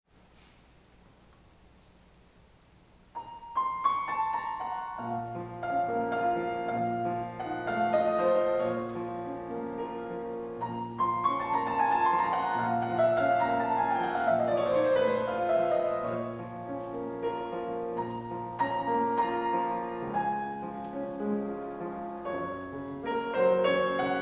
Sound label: Nocturne in B flat Minor LIVE, Piano; Chopin; Op9 No1